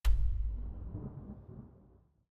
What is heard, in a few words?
toast out, ui; Minecraft; Conquest Default